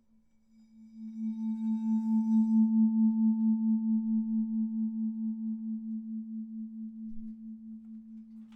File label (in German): Vibraphon Bow H